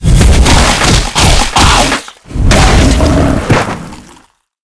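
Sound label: mazel death, monster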